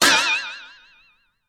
Attack Prepare; Showman

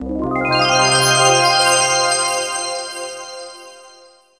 Collectable

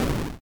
Explosion; Eleven: Stranger Things The Game

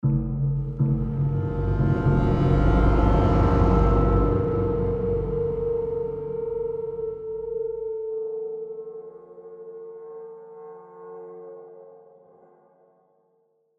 Tension Mood

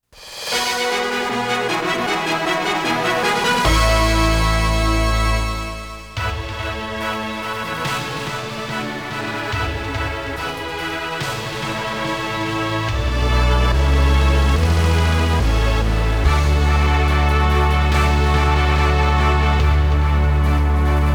Fanfare; Konami Amusement Sounds '94

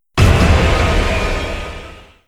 Danger Stinger; A Hat in Time; Creator DLC; Vanessa's Curse

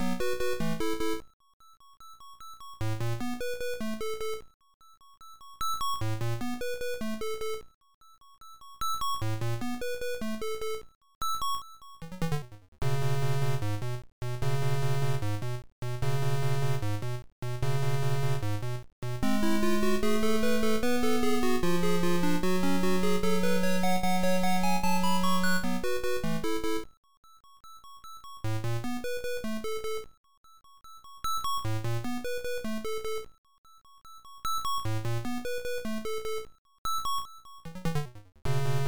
Round BGM; super boy 3; msx

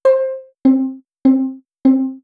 Rhythm Test; super crazy rhythm castle

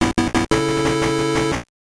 Jingle, soundtrack; trax; gb

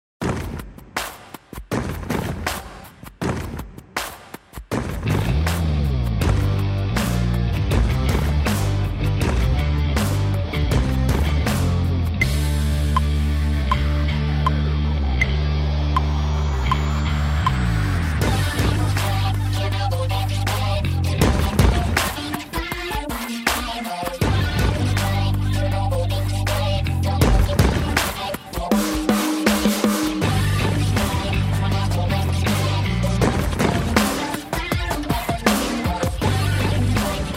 Battle Rock; Digicalized; Android

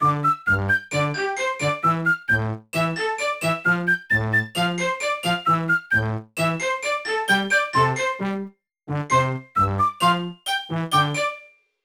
Music Training; Riding Academy 2; NDS

